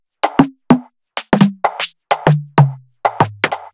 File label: Beat, 128bpm, uk garage